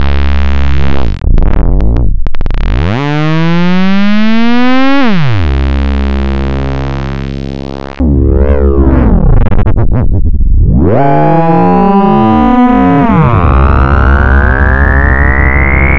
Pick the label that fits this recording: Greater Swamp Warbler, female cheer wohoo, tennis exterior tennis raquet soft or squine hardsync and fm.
squine hardsync and fm